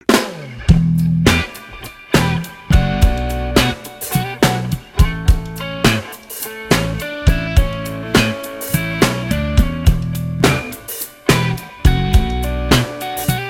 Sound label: cut